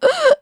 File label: hurt